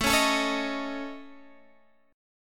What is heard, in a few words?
A Major Flat 5th, Guitar DADGAD; chord